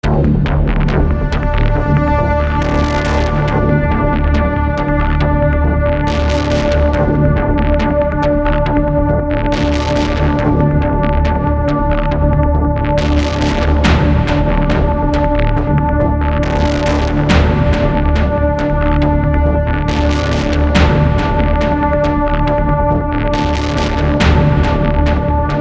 Suspenseful scary horror epic cinematic background music; Mantra Studio